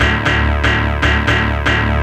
Metal Hits; Jungle; Riffs, Arps & Hits; Blu Mar Ten - Jungle Jungle - 1989 to 1999 Samplepack

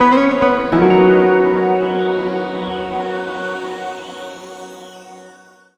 Overcast Guitar; Jungle; Blu Mar Ten - Jungle Jungle - 1989 to 1999 Samplepack

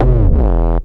Swift Bass - 12B; Jungle; Blu Mar Ten; Jungle - 1989 to 1999

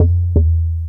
Logik Bass - 9A; Jungle; Blu Mar Ten; Jungle - 1989 to 1999

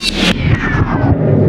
Wind Down; Jungle; FX; Blu Mar Ten - Jungle Jungle - 1989 to 1999 Samplepack

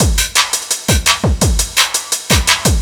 Lights Break; Jungle; Breaks; Blu Mar Ten - Jungle Jungle - 1989 to 1999 Samplepack

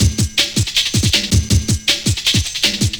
Night Compound Break; Jungle; Breaks; Blu Mar Ten - Jungle Jungle - 1989 to 1999 Samplepack

